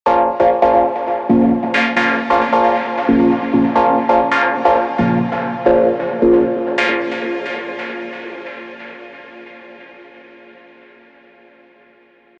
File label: Nostalgik Voices, rave stabs; Spire; revealed